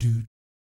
DOOT A#2, Jazz; Spectrasonics; Vocal Planet; Jazz FX; JAZZ COMBO

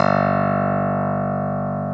CLAV G1; Sonic Reality; Vintage Timetraveler; CLAV PIANET

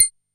CYBER STEEL, synth perc; TECHNO-BOX

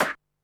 CLAP, Techno 138 BPM; Best Service ProSamples vol.54; AKAI

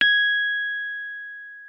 G#6, Rhodes; Xsample VOL-1

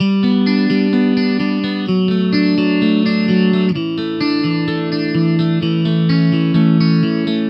Electric Pop Guitar 128BPM Dmaj; Electric Pop Guitars; Vengeance - Essential Clubsounds Vol.4; Guitarloops

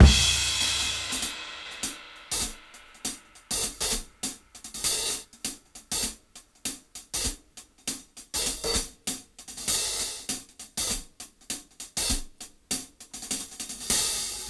Swing 98 BPM; AMG; Keith LeBlanc Phat Butt Jams

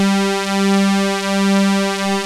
MULTI SYNTH

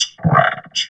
VOX VOX, Electro Vox

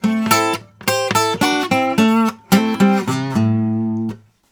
Blues Guitar; ILIO; Fretworks

